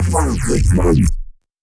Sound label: Reaktor FORM Init, bass